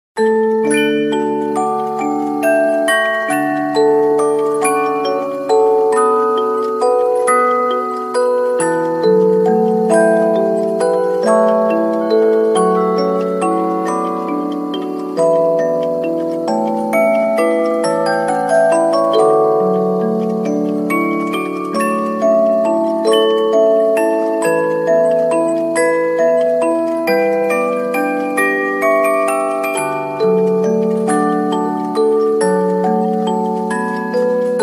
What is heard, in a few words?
rhythm music box wall